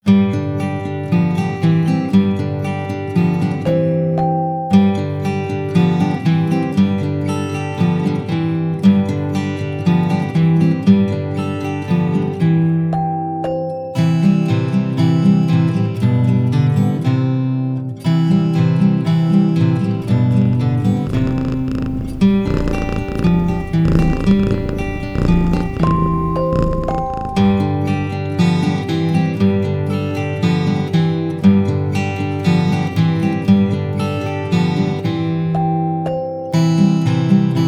Instrumental

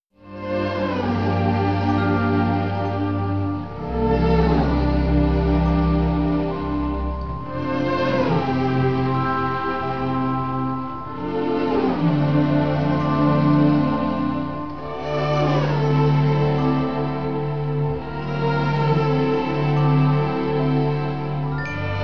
Instrumental; Disney

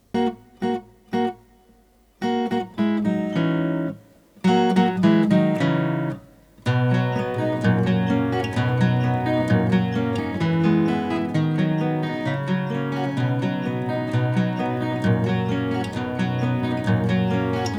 Instrumental

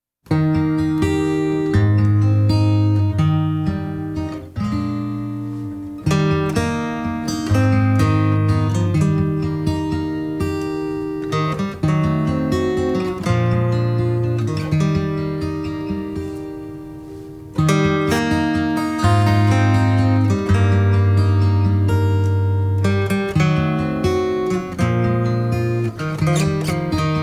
Peaceful Guitar

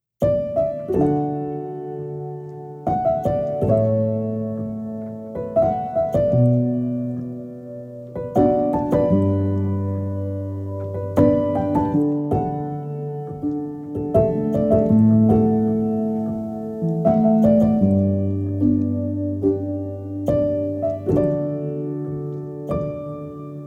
Piano Version; Whisper of the Heart

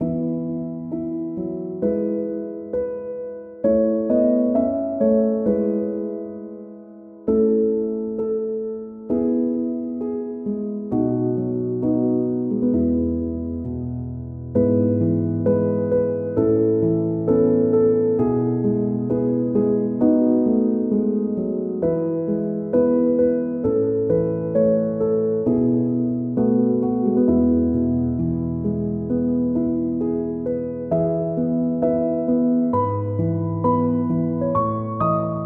Baa Baa Black Sheep (Piano), Lullaby

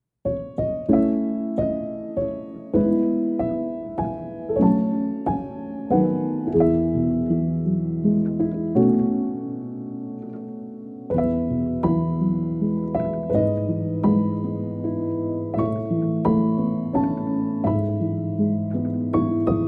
sleep piano; Spirited Away

